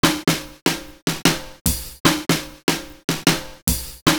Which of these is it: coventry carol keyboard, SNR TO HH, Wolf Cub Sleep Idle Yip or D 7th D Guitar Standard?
SNR TO HH